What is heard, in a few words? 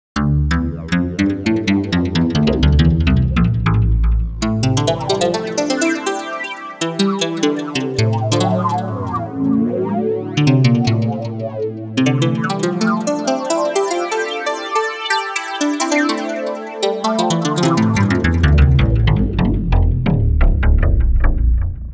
pluck lead use modwhell